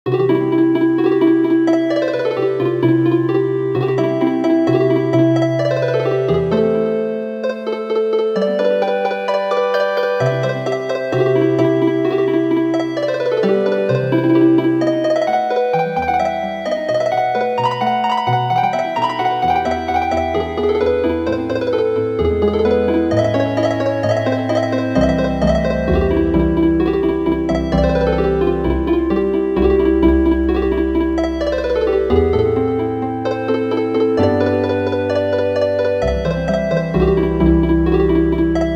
Turkischer Marsch Healin Harp

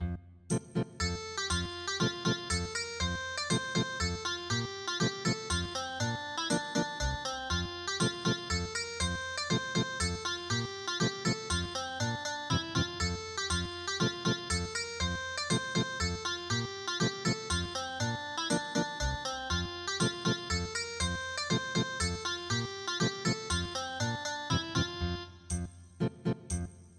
Ethnic Guitar

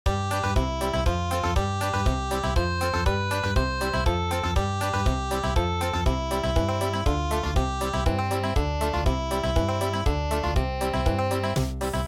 lightning bug Country

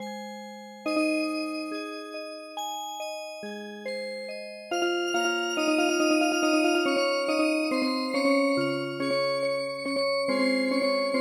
Dance Music Box; midi; traditional

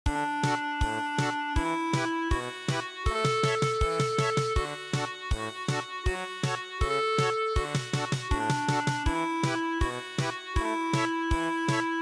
German Polka

